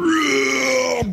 growl male

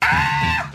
scream

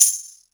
zipper; Tamborine

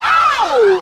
ow

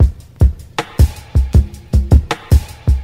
Drum loops, Boombap

